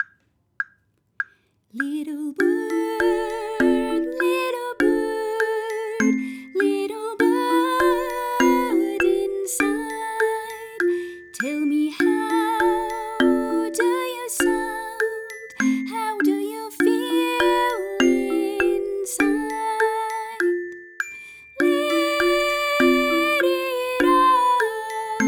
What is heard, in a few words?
the little bird inside instrumental; International Orff-Schulwerk Forum Salzburg, Convention 2025